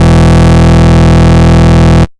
Bass Shot E

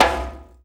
Ethno Snare; Drum Hits; Loopmasters; Jon Carter & Alex Blanco; Block Party Essentials

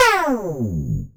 Synth Shot F; Mutekki Media; Vengeance Electroshock Vol.2

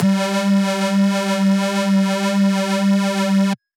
Dirty Dutch Lead G2

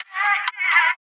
126 F# VOCAL LOOP, Funky Underground; Loopmasters; Funky Underground Vol 2

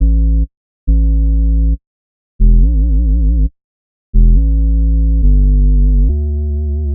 Bpm138 Bass Loop Am; Sign; Prime Loops; Dubstep Illusions

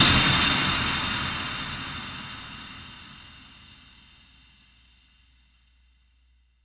FX Impact

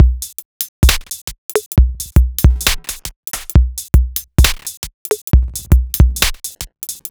Bpm135 Break, dubstep drum loops; Polluter

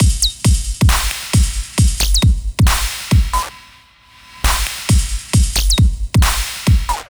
Bpm135 NoVerb Break, dubstep drum loops

